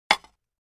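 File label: Large Tile Square Pieces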